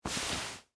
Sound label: Wool Coat Wipe, Clothes, Hand, Wipe; Foley; Dressing (Sound Effects:Human); BlastWave